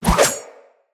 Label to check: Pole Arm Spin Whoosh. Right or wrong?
Right